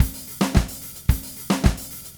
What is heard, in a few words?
Red Maple Groove 110, DW Red Maple Kit